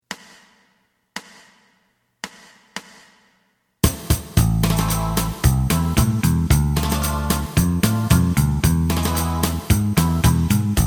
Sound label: lead